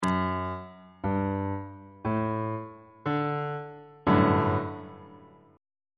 g major 7th suspended 2nd 3rd inversion on bass clef